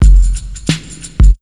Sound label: DRMS, Underground Hip Hop